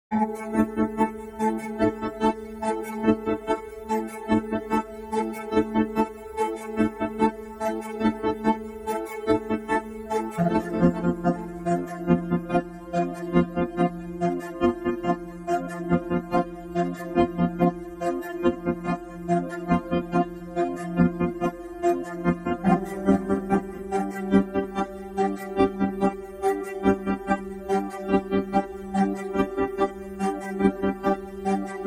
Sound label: drone